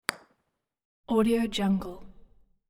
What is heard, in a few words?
golf ball putt; hollywoodedge